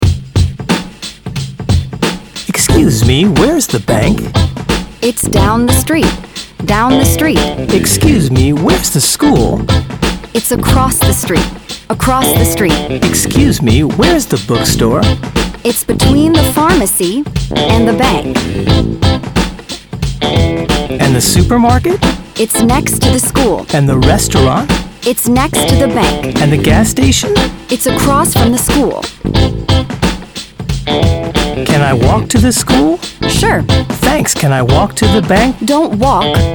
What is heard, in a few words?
Practi-Chants, Excuse me.; Connectivity Foundation